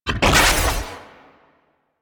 Arch Gun Primary Beam Shot; Warframe; Archwing; Hildryn

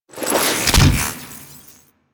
Provide Fish; Warframe; Fortuna; Fishmonger

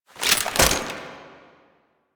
Belt Fed Rifle Reload; Weapons; Warframe; Tenno; Frumentarius

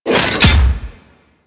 Ghoul Devourer Hook Tongue; Warframe; Grineer Ghouls

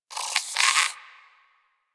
Rodent Vocal Snore; Warframe; Hunting Critters

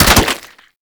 Infested Devourer Swing Hit B; Warframe

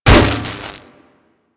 Electro Prod Hit; Warframe; Weapons

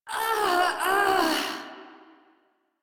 Death; Priest Quest; Palladino

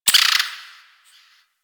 Forest Distant Medium Creature Tree Small Call

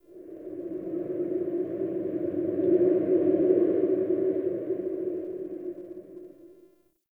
Under City Wind Howl

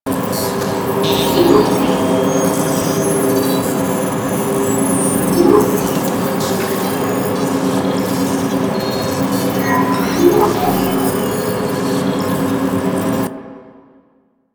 Computer Beep; Warframe; Veilbreaker; Quests; Ambience; Kahl

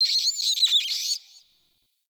Night Zone Tree Critters, Ambience Plains; Warframe; Eidolon Plains; One Offs

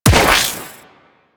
Sniper Proj Explode; Warframe; Weapons; Sentient; Crp Sent Amlg Sniper

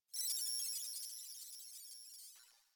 Computer Room Ambient Beeping; Warframe; Ambience; Nineteen Ninety Nine

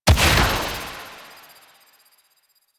Fairy Soul Explosion; DE; Warframe

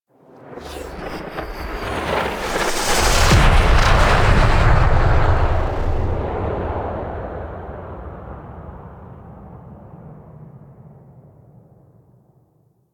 Ship Enter; M1 Vala; Warframe; Wraith Quest

